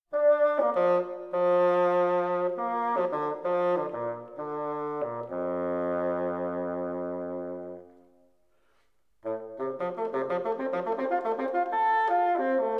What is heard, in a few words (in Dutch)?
Fagot; Instrumenten orkest